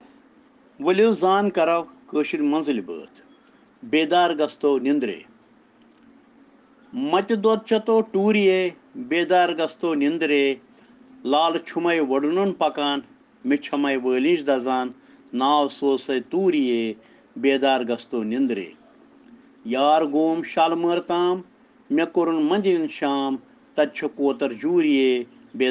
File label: Children Rhyms in Kashmiri